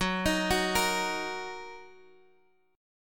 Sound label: Gb 6th Gb down Guitar Drop D